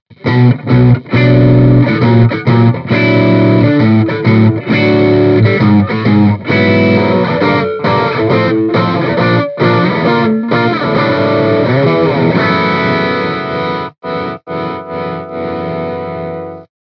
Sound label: Mic Inside G12H 65 Overdrive Les Paul; Mini Rox